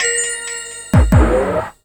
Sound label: Dope Hits; Jungle; Blu Mar Ten - Jungle Jungle - 1989 to 1999 Samplepack